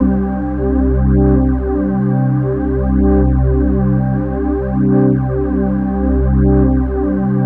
tension, ambient atmospheres

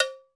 Heifer, Alesis D4

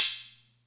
Atlantex Music Percussion Computer Cymbal Short

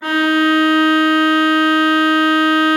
D4, Cathedral Organ, HOLZPRINZIP; Propeller Island

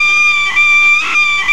whistle, Tea Party; SFX

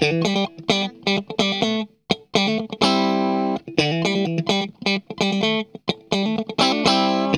STRUT, Funk Guitar; 90 s Sample CDs; Zero G

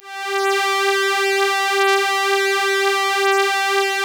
OBERHEIMS

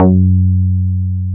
GLORY F#2, BASSES; Best Service Alpha Dance vol.2

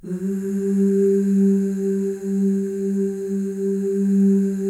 FEM OOS, Symphony of Voices

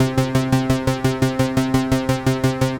Seq Classic Ana, Drum N Bass, Keys Pads; Sonic Foundry (Sony Creative Software); Knutrix Drum & Bass

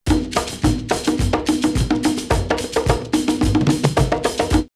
Nouakchoti 108, drumloops World & Ethnic; X-Static Goldmine

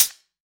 Closed HH

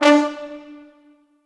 D3 R, Orchestral Brass

